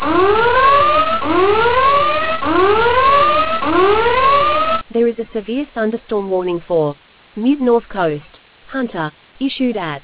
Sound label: storm audio